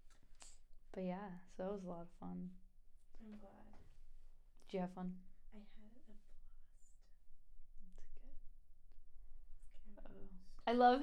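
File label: vocals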